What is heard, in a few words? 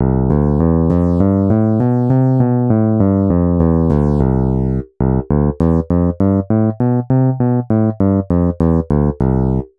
c2 scale, strings only, piano